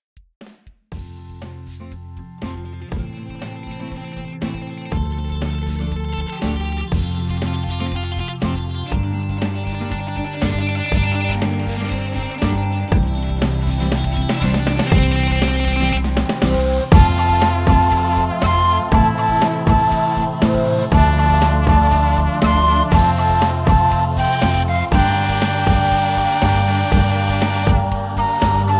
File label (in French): flute trompette; version instrumentale